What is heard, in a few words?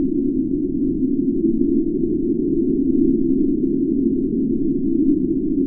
garage ambient; Dystopia